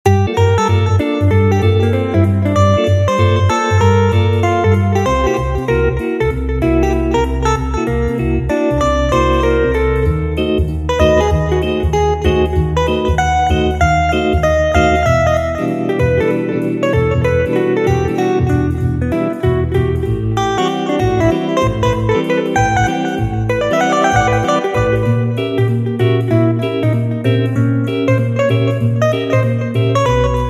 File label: Bebop (Guitar)